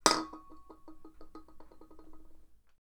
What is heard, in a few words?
Empty Beer Can